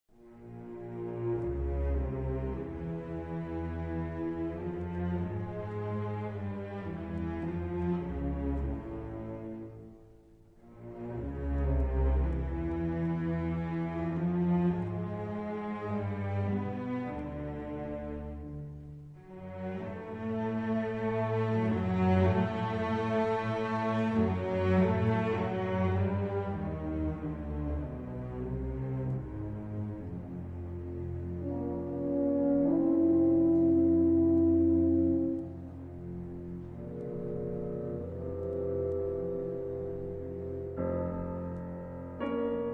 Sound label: concerto for piano and orchestra